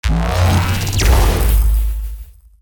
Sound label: teleport off map; ROR2